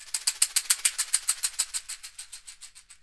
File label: CAXIXI